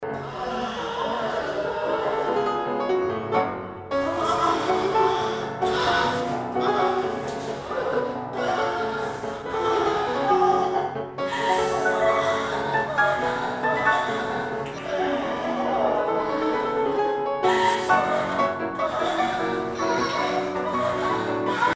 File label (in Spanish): danza hungara